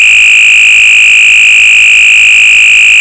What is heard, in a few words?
tone